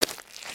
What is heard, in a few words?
Foot Mud Walk Light; footsteps